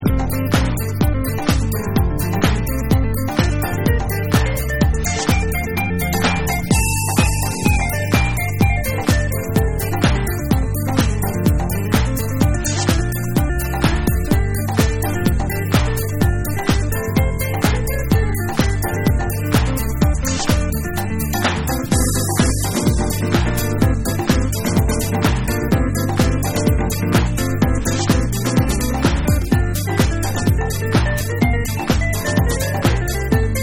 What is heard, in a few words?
Guitar Mix